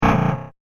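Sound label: Science Fiction Closing; science fiction sound effect; star wars / trek style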